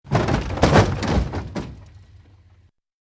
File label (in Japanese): ドドドー